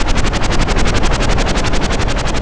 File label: chopper loop; quarterback